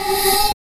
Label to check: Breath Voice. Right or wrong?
Right